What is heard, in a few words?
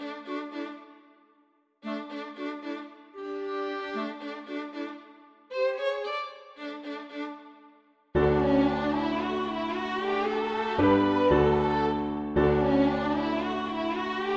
Tenor, song